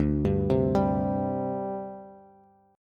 D 7th Suspended 4th D Bass; arpeggiated; JGuitar

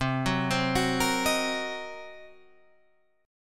C Minor 7th Flat 5th Guitar Half Step Down, chord

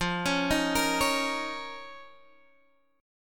D sharp 7th Suspended 2nd F, Guitar

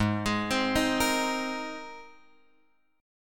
G sharp Major Add 9th, chord; G#add9 Chord {4 X 6 5 4 6} arpeggiated

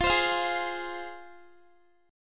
F Minor Guitar, chord; strummed; x,20,22,22,20,x; 4E,4A,5D,5G,5C,6F

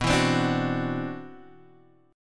C-Major Sharp 11th B, guitar

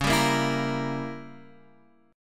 C sharp Diminished 7th C sharp down Guitar Open F; C#dim7 strummed; JGuitar

